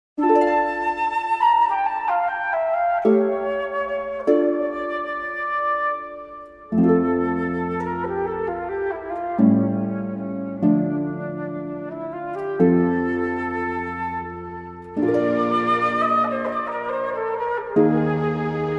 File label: orch